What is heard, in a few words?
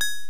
glass Hit